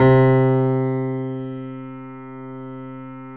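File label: grand piano C 2; USB Soundscan; AKAI; Keyboards Acoustic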